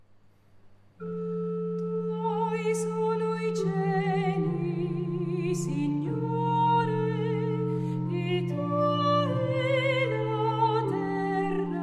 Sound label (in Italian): Salmello; Sabato Veglia; dopo la I lettura